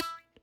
Hohner Special20 Normal rel E3, Harmonica, Releases; Aerophones; Free Aerophones